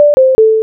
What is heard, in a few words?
lose